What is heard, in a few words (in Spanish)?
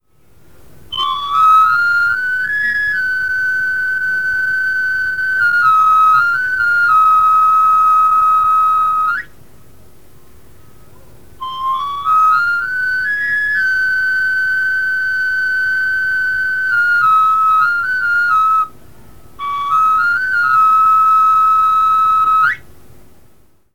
Afilador